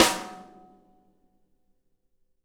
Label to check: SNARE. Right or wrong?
Right